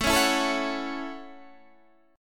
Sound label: A Major Add 13th A, chord